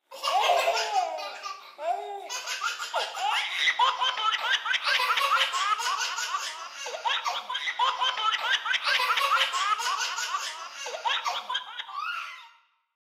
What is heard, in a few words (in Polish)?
my body smiech; dzwieki dla maluszka moje cialo